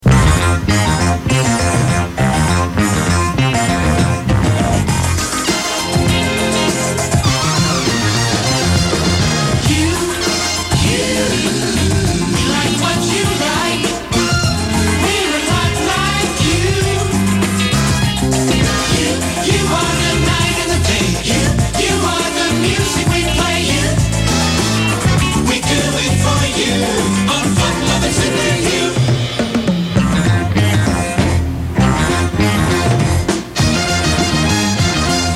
Jingle; WYOO; FL Super U

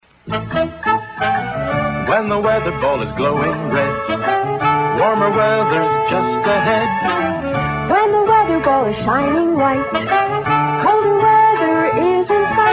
Weatherball Jingle; WCCO AM; 1970s

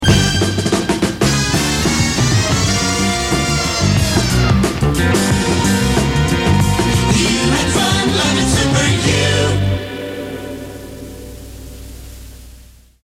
Jingle; WYOO; FL Super U

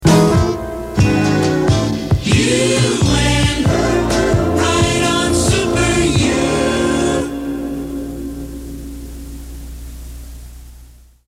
Jingle; WYOO; RO Super U

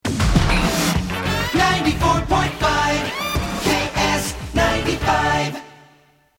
Jingle; KSTP FM

